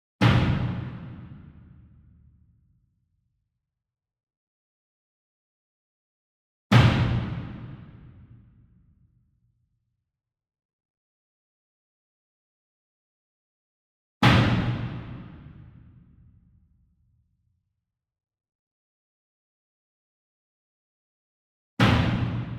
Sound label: Single Drum Verdi 66 Inch Hit Soft Beater Far; boom library; Cinematic Strikes Construction Kit